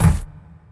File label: armor; RYL